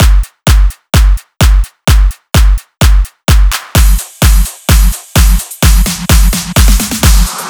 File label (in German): Drum Loop 128BPM Full ohne Bass